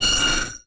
Multimedia Internet CD-Rom Flash Element

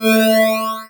Multimedia Internet CD-Rom Flash Whoosh

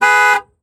Auto Horn Mini Van Horn Medium; Transportation

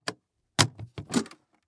Household Briefcase Unlock And Open

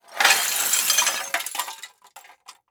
Crash Metal And Glass Debris Pushing On Concrete; Crashes

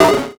Multimedia Internet CD-Rom Flash Blast

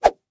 Staff Whip, weapons; AdobeCC

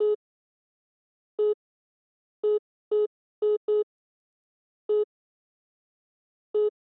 Electronic ATM Machine Sequence Beeps

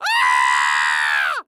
Cartoon Human Male Scream Old Lady Scream; AdobeCC

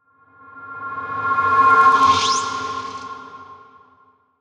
Transition Cymbal Element; Production Element Title Transition

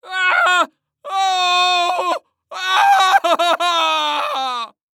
Horror Scary Human Male Scream Crying Scream

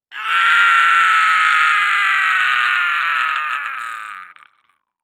Horror Scary Human Scream Male Pain

